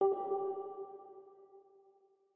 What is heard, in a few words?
organ